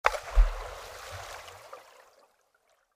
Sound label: stone falls into the water